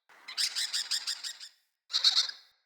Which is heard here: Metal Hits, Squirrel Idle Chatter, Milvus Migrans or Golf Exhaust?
Squirrel Idle Chatter